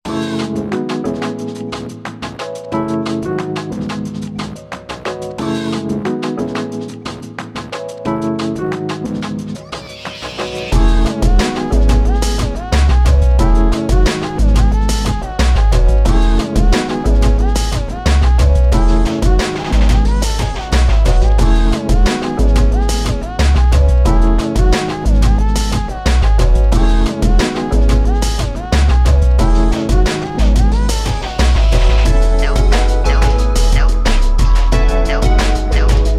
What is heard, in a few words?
(Instrumental); Friday Night Funkin Bio's Remix Pack